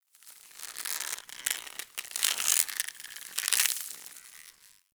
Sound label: Tendon Stretch Medium Close; Ambience; Warframe; Infested Eris